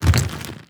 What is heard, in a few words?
subtle, zombie blind, foley; creatures